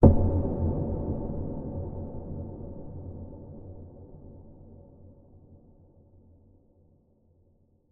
sound design knock hit wooden horror suspense cinematic